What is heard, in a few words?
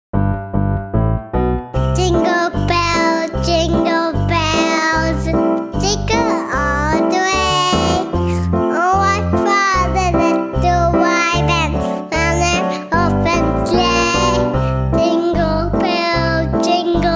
Jingle Bells